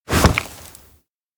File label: grab arm; kurumi; anemoi